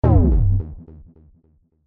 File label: Enemy Hit